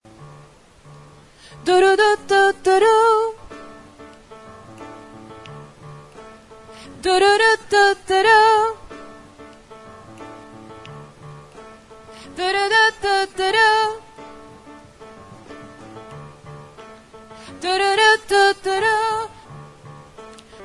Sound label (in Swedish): sopran